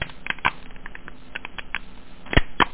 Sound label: crack; dosgames; dragon